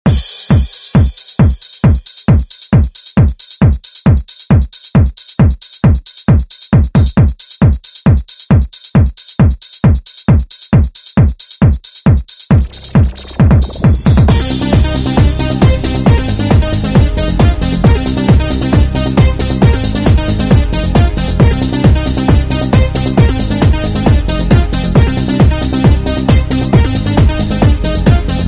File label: 90s Re Drum; DJ Mhark